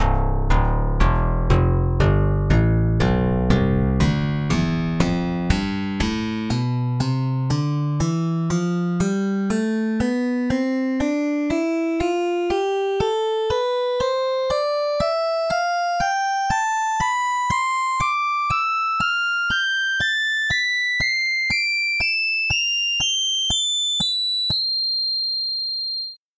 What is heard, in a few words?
slap bass tonleiter